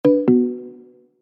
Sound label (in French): BIP progression